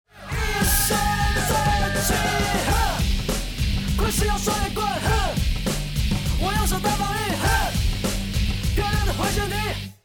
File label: bomb ten sec count; music kits custom